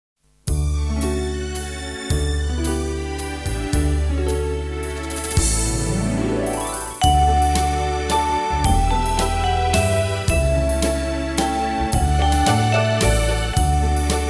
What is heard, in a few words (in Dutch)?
Lied van de maand karaoke